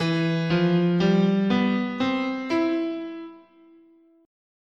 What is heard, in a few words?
E Balinese Pelog, scale